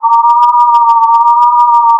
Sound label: distort; distort samples